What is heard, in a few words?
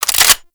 Lever Action Rifle Reload; Tenno